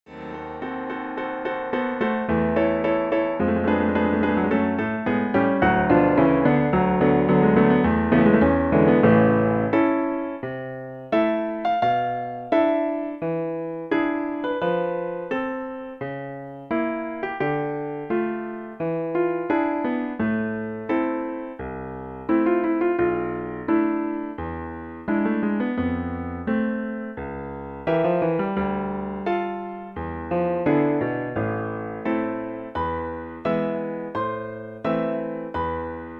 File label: arpeggione sonata